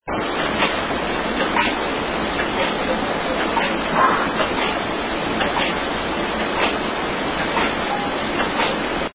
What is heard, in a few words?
Living Room sounds like a crow